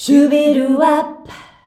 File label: "SHUBIWAP" D, FEMALES